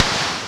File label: noise fx; Masterbits; Switch FX